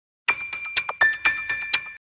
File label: Message